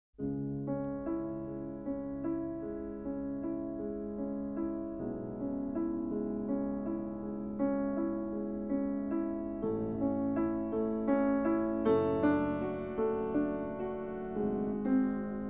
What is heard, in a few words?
Piano Sonata no. 14 in C-sharp minor op. 27 no. 2 Moonlight; Ludwig van Beethoven